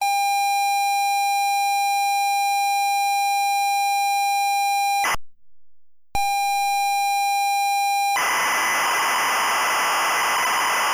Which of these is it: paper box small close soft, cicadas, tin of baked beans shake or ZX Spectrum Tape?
ZX Spectrum Tape